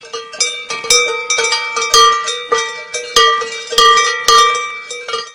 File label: Cow Bells Sounds Car Horn